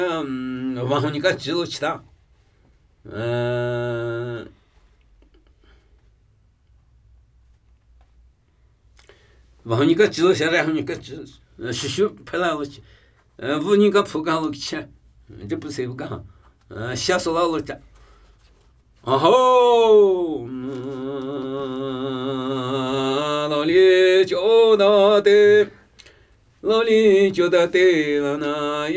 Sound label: Namuyi Tibetan Ritual Chanting